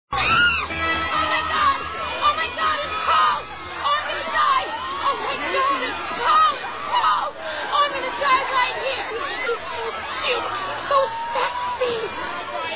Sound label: cardboard